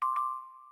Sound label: new chat; friendica; jappixmini